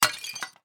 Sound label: environment object ceramic breaking